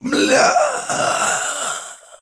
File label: death; metrocraft; customnpcs